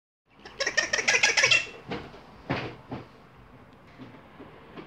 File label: giggle